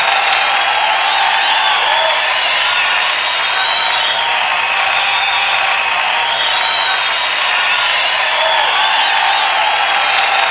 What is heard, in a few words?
a crowd